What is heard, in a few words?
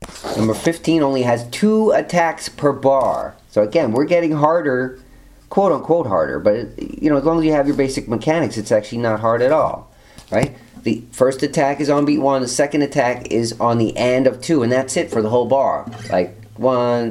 strumming